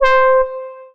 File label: Yamaha FS1r Lead Horn C5